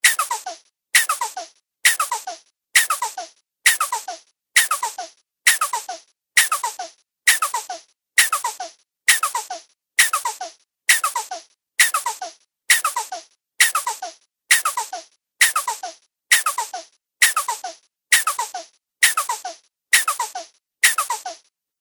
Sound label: Cartoon Repetitive Pump